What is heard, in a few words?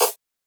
perc; Anthemic.Electro; drum hits